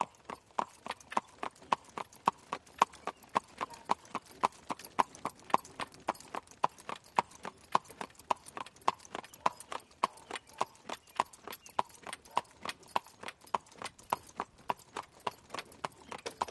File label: HORSE AND BUGGY TROTTING